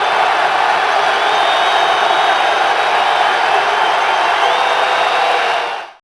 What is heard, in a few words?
Cheer; GTA - Long Night